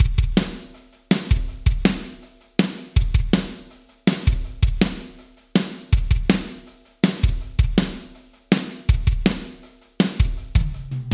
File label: Drums Yamaha ProR3 Small Room Vintage Digital